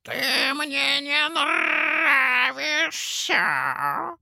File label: parrot